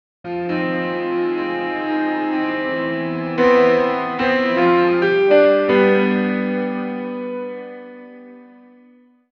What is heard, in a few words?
sad piano sound